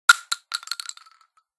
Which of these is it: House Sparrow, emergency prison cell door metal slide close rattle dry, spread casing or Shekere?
spread casing